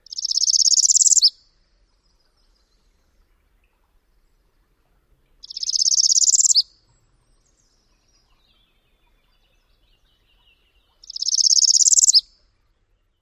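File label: Northern Parula, typical songs number 1; NY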